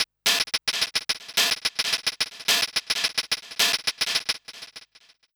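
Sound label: RAZE hi-hat; Big Fish Audio; Uncivilised G'rooves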